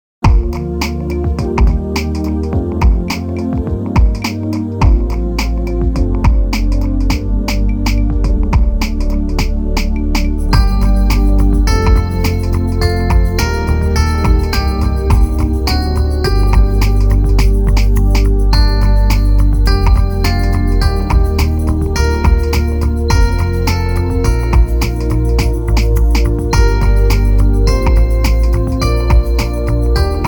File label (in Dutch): Psalm 100 instr